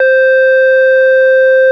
beep

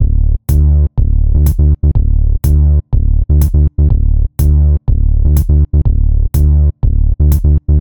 simple drum and bass

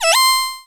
surprise

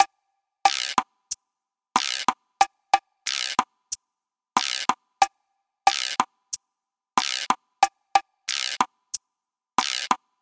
goofy rhythm

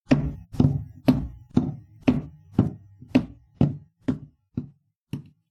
walk

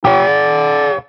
rock guitar

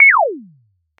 pyun

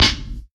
gas gun sound; 《思考停止》フリージングル; 思考停止しているようなオルガンのループできるジングル。ぜんぜん頭が回らない時に脳内に流れるやつ。

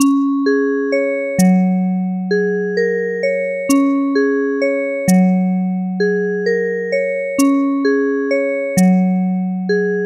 relaxing time

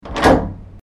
doorknob

